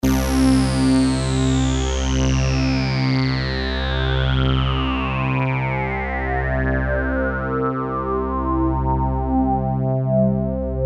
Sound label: downsweep; obx; opxvst